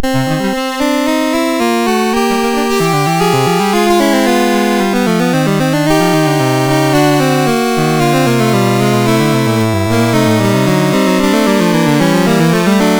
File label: Canon a 2 per Tonos, MOS6581R2